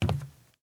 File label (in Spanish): Paso zapato madera